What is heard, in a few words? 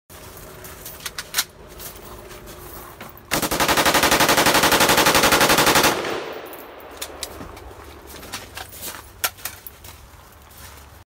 Steyr AUG; اسلحه اشتایر آاوگ